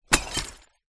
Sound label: broken glass in cardboard box drop down on ground; foley